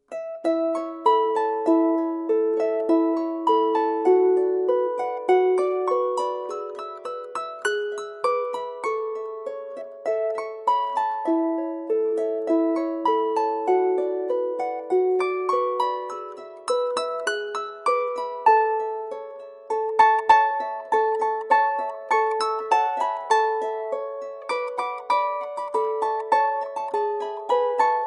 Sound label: jingle bells